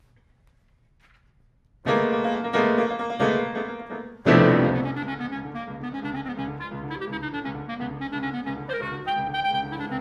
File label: rhapsody for clarinet and piano; eryilmaz; iv lezgi